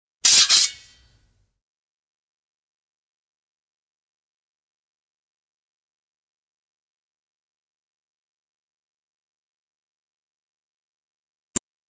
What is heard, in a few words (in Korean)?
Fights, 싸움-전투; 헐리웃특수효과음